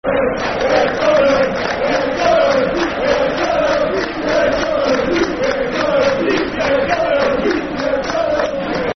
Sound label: We Hate Tottenham Fast, Football Chants (Soccer Song); Chelsea Cfc